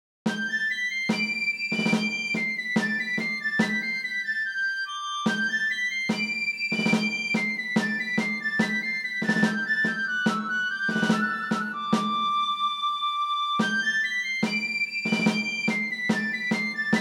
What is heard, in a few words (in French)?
marche mousquetaires